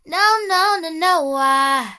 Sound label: no no nah no uh SUNG; Voice